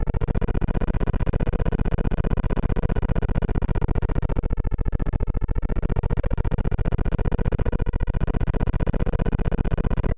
keys glitches pitch dist

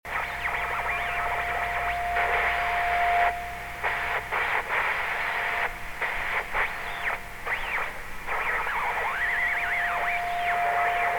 mr distorto angry wiggles

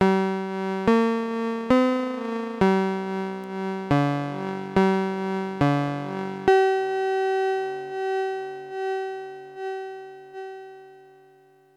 piano sus env; kosmic shade

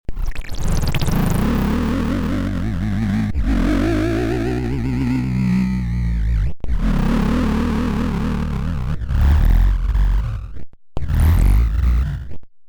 dog pitch contact; morphotron

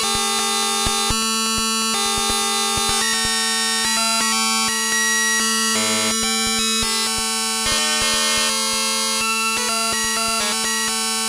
pss-140 electric bagpipes

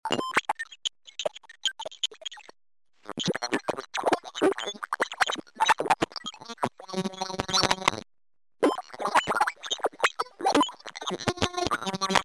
whiz-kid glitches

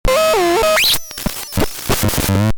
phone button warp

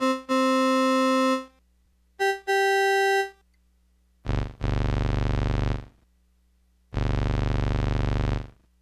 pss-140 pitch comparison

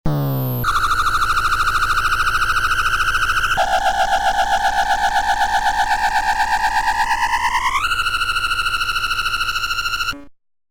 piano pitch drop; morphotron

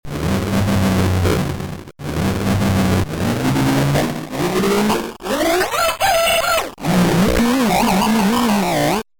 rumble barn rooster

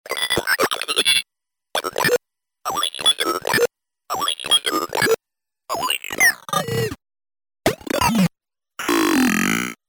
incantor glitches pitch

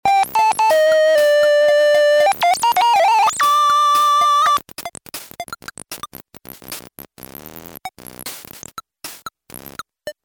rhythm hyperdrive

